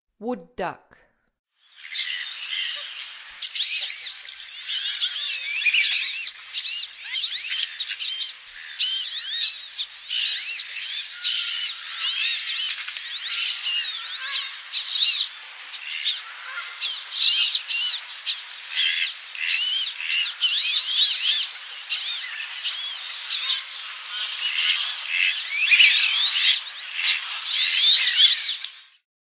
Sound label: Wood Duck; North America